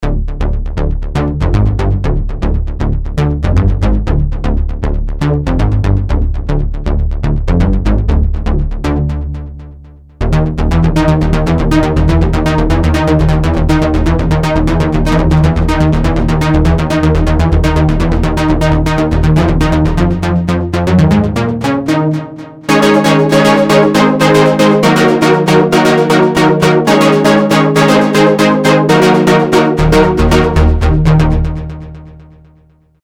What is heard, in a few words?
BASS